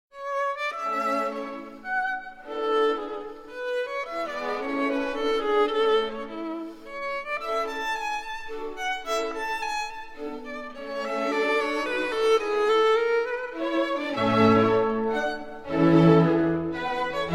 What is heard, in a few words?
Concerto No. 5 in A Major for Violin and Orchestra